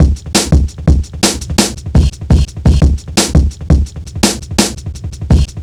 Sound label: Drum Bass, Drumloops, 170bpm; Zero-G; Total Drum Bass